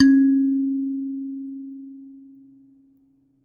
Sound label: Finger, Prepared Kit; Battery 3 Sample Kits